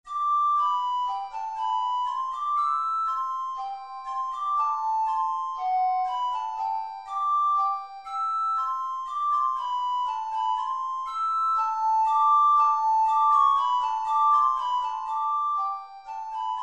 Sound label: melody; lewis alastair